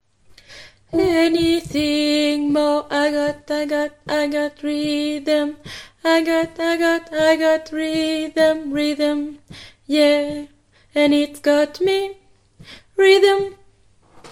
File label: alto